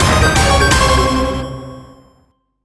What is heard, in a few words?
Alarm Fight Trigger Start; Megabyte Punch